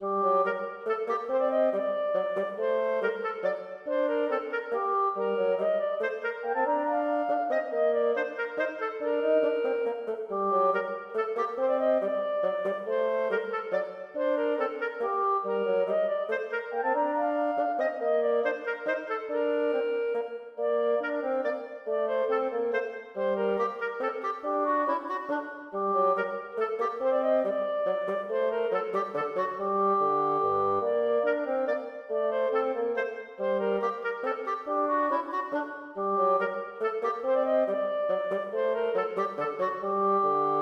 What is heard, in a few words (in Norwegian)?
Menue for klarinett og fagott; Bang